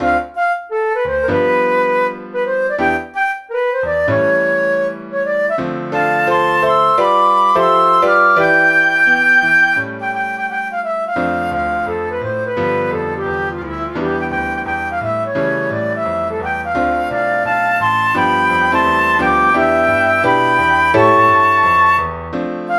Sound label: 2 flutes & piano; MSmidi